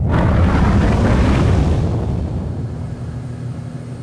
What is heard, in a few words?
Ship end, vehicles